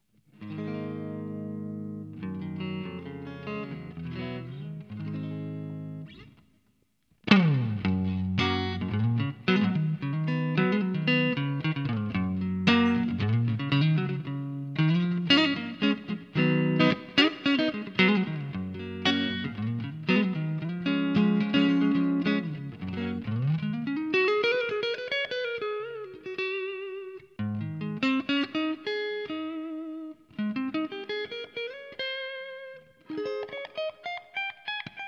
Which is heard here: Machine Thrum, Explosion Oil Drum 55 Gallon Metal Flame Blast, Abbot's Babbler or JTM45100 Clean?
JTM45100 Clean